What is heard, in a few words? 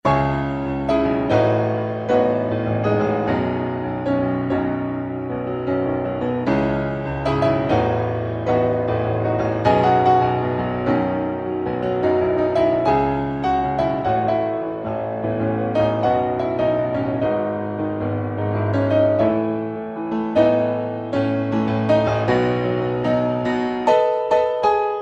ringtones piano; frozen